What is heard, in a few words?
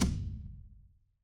transition impact hit